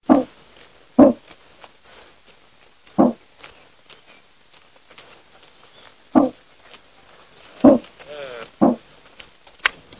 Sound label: DEER